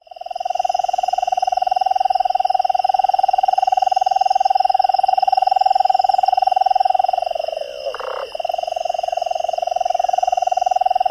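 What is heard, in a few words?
song, bird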